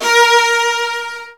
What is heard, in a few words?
Virtuoso Strings